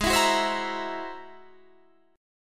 G sharp Augmented 9th, Guitar, Half Step Down

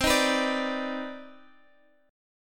C Minor Major 9th C Guitar Half Step Down

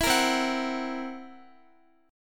C 7th Flat 5th C down Guitar; C7b5 strummed

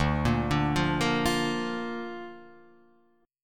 D Minor Sharp 5th D down; Dm#5 arpeggiated; JGuitar

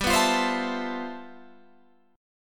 G 13th G, chord

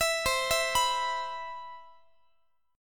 C Major 7th, Guitar; CM7 arpeggiated

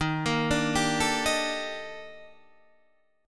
Eb Major Sharp 11th Eb Guitar DADGAD

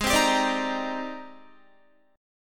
G sharp Major Sharp 11th, Guitar; G#M#11; 15,15,19,19,15,18; strummed

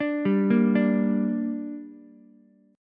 D Suspended 4th Sharp 5th D down Ukulele; arpeggiated

